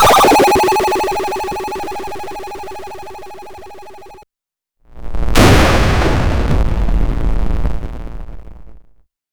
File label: a lego motion song in